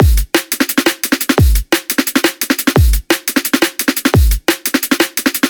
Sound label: Drum Breakbeat with Kick, drum and bass